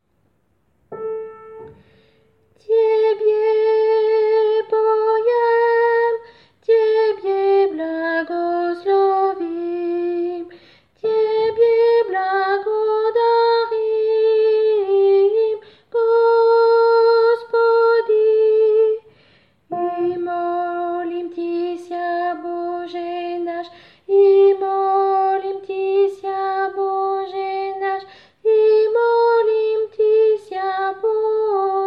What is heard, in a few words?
soprane; Tebe